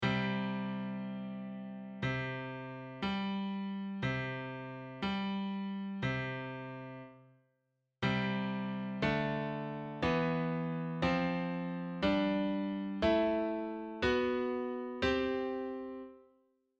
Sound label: perfect fifth